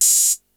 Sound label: open hat, Yamaha RY-8